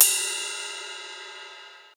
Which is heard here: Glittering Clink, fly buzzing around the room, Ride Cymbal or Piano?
Ride Cymbal